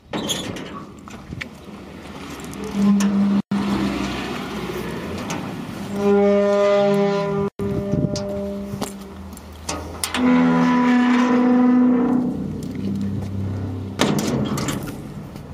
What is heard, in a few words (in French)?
BRUIT PORTAIL sortie; VILLIERS EN PLAINE 79; CIMETERES